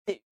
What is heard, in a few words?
female voice, Stoat